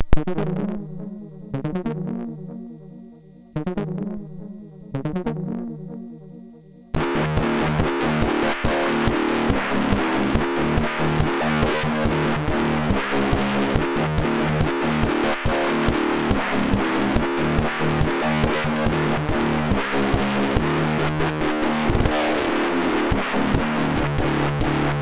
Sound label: Heavyweight, MOS6581